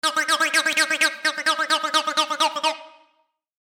グラビティチューブ

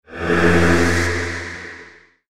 魔法詠唱

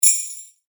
ジングルベル 単体 響きなし

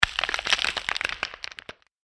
壁にヒビ

